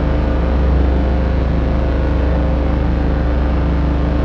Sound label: ship engines; World Of Warships